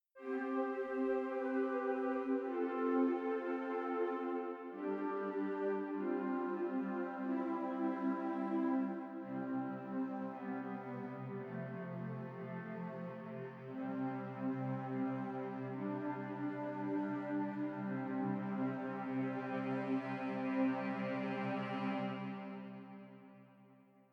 woodwinds; Spitfire Audio; Producer Portfolio; PP0025EVO GRID 4 – WOODWINDS

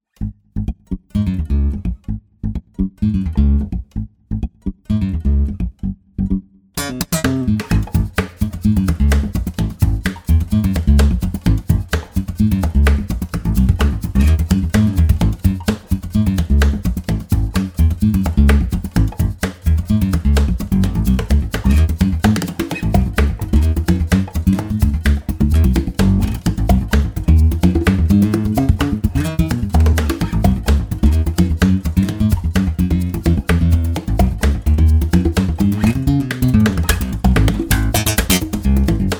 Acoustic Funky

